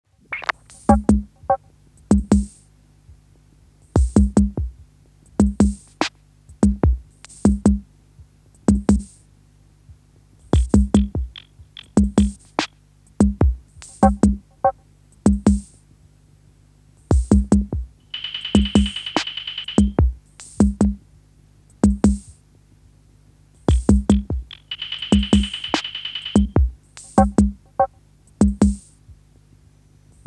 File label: drum computer